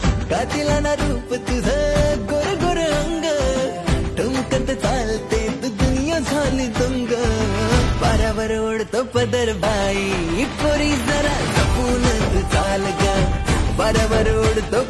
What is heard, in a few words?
Ringtone